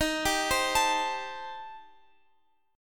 Eb 6th Flat 5th Eb down Guitar Open F, Eb6b5 chord; Eb6b5 arpeggiated; JGuitar